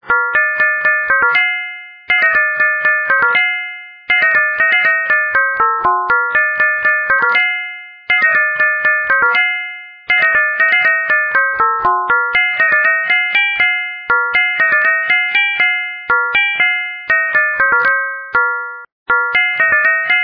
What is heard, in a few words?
doorbell; vivaldis